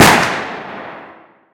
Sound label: sniper fire, weapons; hl2